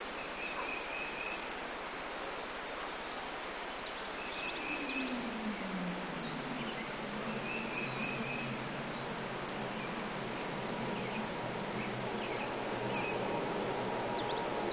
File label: boeing